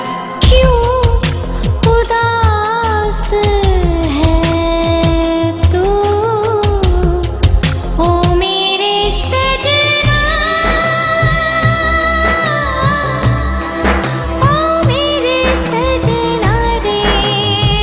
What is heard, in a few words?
Sad Ringtone